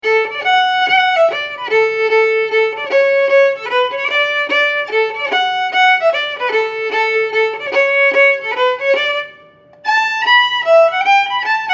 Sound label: Polka Mazurka; Dave Barklas